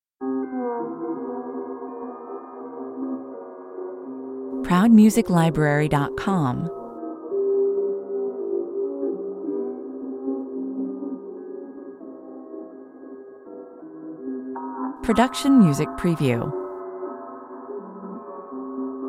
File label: sad machines 120 bpm